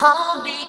Vocal Phrases; Vengeance Samples; Vocals

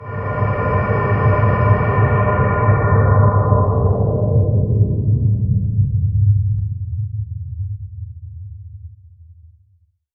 VFX Atmos

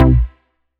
Bass C, Offbeat Bass

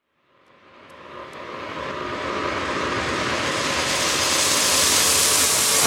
FX Uplifter

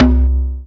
Minimal Perc; Minimal Percussion

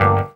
Synth Shots; Vengeance Samples

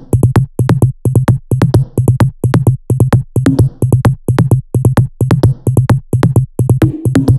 130BPM Melody; Vengeance Samples; Pressure 1 Kit

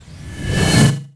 Short Fills & Reverse; Vengeance Samples

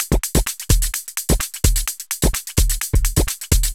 Houseloops 128BPM; Vengeance Samples

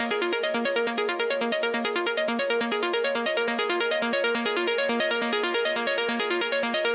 Pluck 138BPM WET, A# minor; Melody & Synth; Vengeance Samples; Fusion Kit

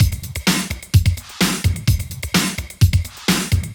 Housebeats 128BPM; Vengeance Samples

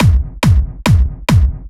Kick, 140 BPM; One Second

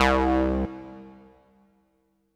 303 Acid C; Vengeance Samples

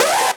Synths A; vengeance essential club sounds-3

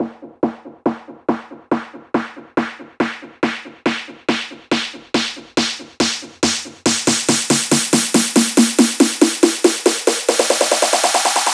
140BPM SNARE 8 Bars; Vengeance Samples; Snares&FX Pairs

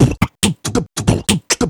Beatbox Loops, Human Beatbox

